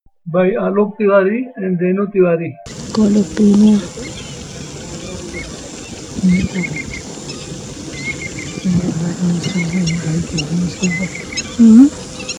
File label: Jacobin Cuckoo; Alok